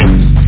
technobass; 8,363 sample rate; Amiga 8-bit Sampled Voice

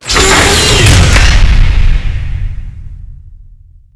sub pen sub clamp close; mechanics; Spearhead Demo; MacFormat 2003 June

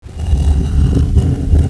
stone up; Renaissance

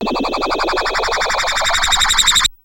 launch, synths, dancerap

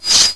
sword drawn; Exile III v1.0

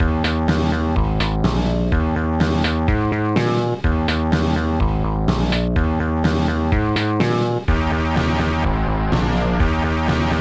bassline; OctaMED SoundStudio V1; mug-members

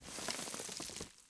ROCK; rogue spear demo

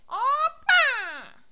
fireball; Worms

